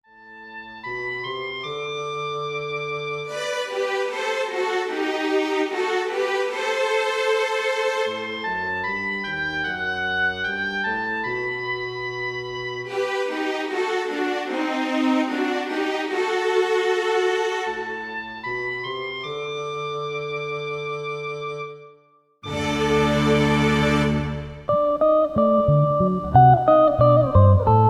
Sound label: Symphony in Raga Chakravakam